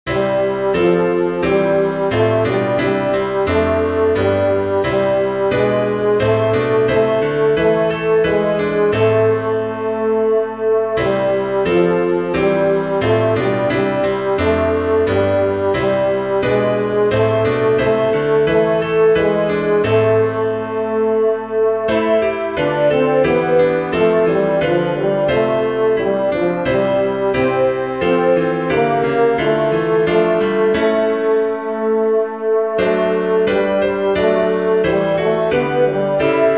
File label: passion chorale tenor; by Hans Leo Hassler (1564-1612)